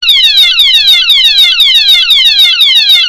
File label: Police Patrol Car Sound